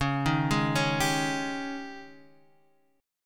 G sharp Major 7th Suspended 4th, C sharp

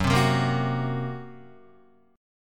F sharp Major Flat 5th, Guitar; strummed; 4F,4A,5C,5G,5C,6E; 1,3,0,3,0,2

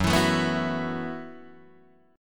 Gb Minor 11th Gb Guitar DADGAD

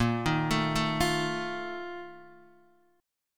Bb Major 7th Suspended 4th, Guitar, DADGAD; x,1,1,2,0,3

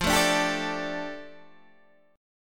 F 6th Add 9th F down Guitar Standard, chord; F6add9 strummed

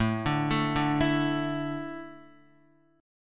A Suspended 4th, Guitar; Asus4 arpeggiated; JGuitar

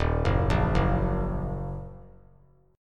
Eb Minor Eb 3C 3F 3A 3D; Ebm arpeggiated; JGuitar

